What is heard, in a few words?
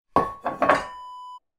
water scoop turn around